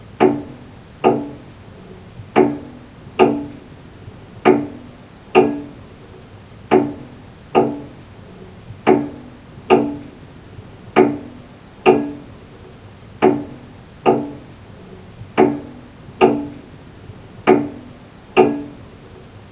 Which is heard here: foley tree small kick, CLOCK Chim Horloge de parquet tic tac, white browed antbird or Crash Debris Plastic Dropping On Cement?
CLOCK Chim Horloge de parquet tic tac